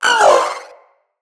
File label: Vocal Pain, Pets; Zanuka; Zanuka Companion A